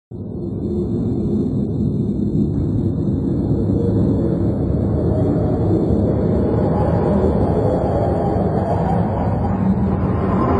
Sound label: swirling hurricane wind